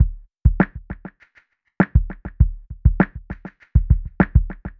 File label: Drum Effect Filter Delay